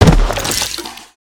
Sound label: instrument smash; destructibles